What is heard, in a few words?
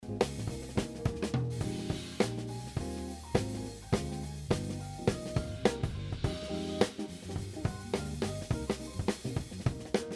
mic drum sample, drum